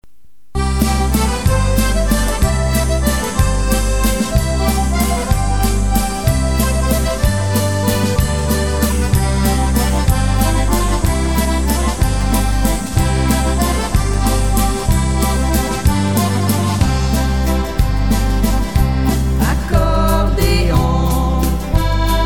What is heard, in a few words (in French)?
accordeonmania